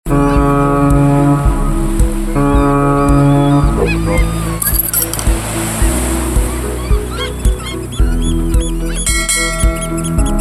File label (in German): Klingelton Android